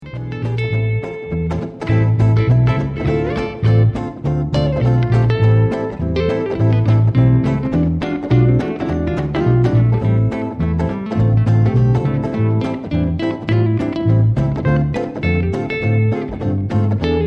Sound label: jazz des gitanes